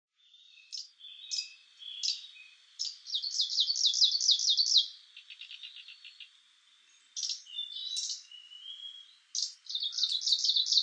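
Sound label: Arctic warbler, fukuoka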